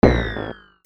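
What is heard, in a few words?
percussion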